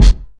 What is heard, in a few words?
punchy kick single shot f key; kick drum samples